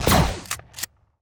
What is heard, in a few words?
Shotgun; unsighted